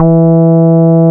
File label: BASS, Tekno Industrial; East West